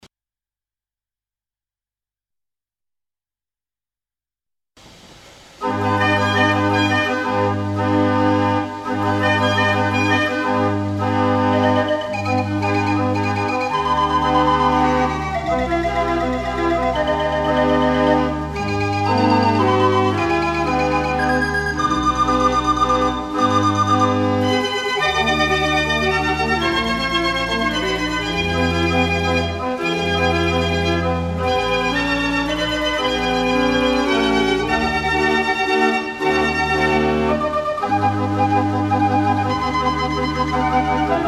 Oh mia bambino caro, Carl Frei orgel; Carl Frei orgel "De Cementmolen"

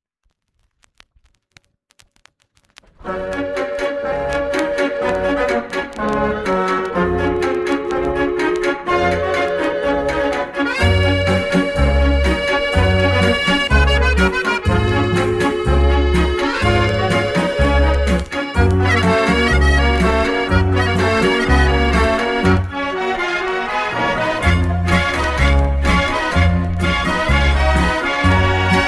mortier orgel; Madeleine

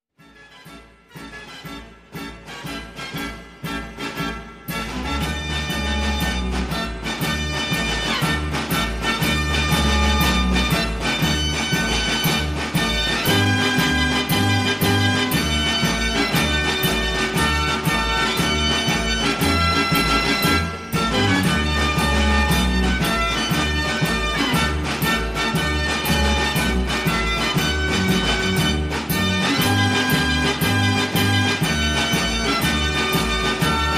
hand in hand

